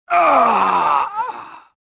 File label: death; Star Wars Jedi Knight II Jedi Outcast; XBOX; bespincop